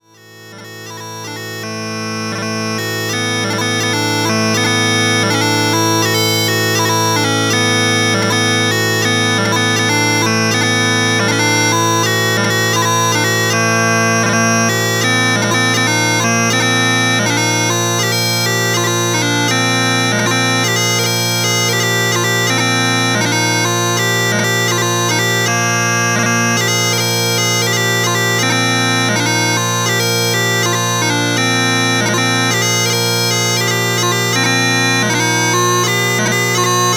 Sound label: SPIPE Pitch Change, bagpipes